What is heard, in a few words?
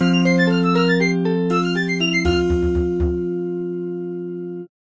level up; Fishing